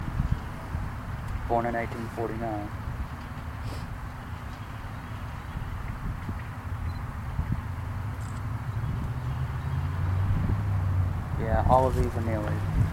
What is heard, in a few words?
swinging rope sound